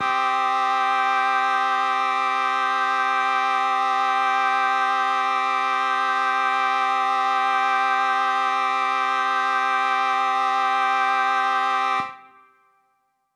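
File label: Mixed Winds C minor, synth orchestra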